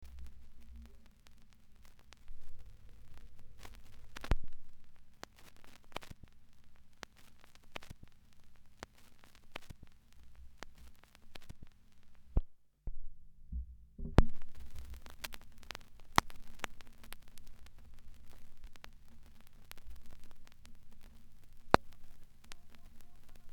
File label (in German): plattenknistern